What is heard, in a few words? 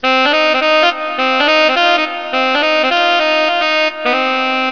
Saxophone; psion cd vol1